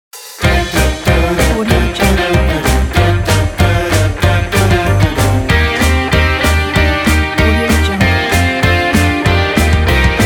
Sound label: energetic youth rock logo